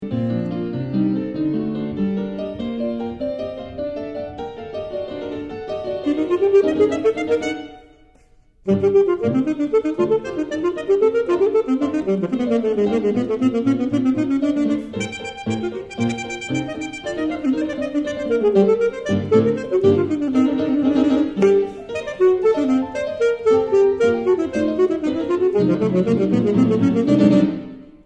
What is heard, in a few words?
Sonata for Alto Sax